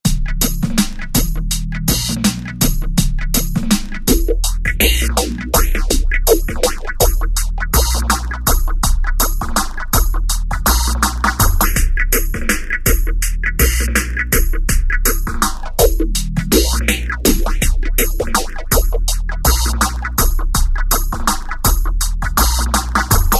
Wah Hop; Korg ES-1; Factory Patterns

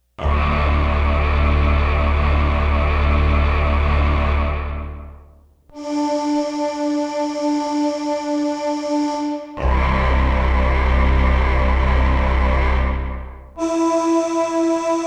LOW MONDO VOICES HISS VOCALS

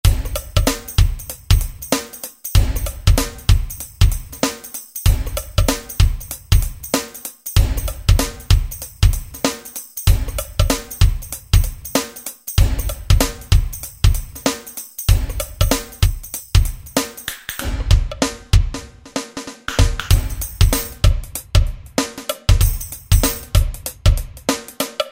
Rap, Alesis SR-16 Preset Patterns

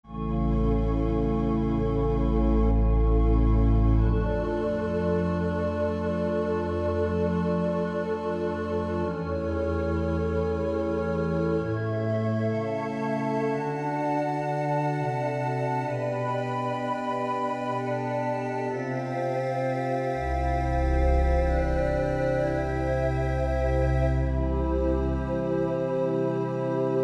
Pan Bel Pad; Korg MSC-1S Variety

